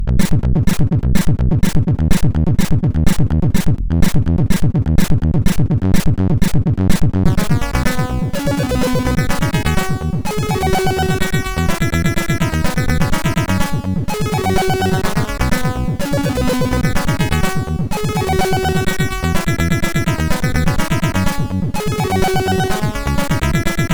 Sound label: sid MOS6581R4; I co ja robie tu